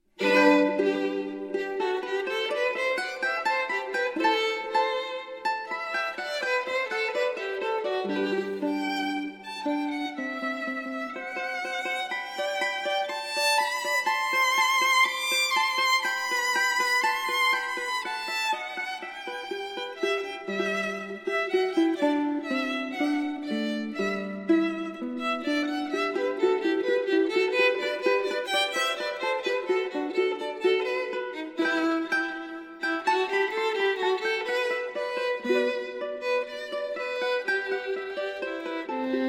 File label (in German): Duo Mandoline & Geige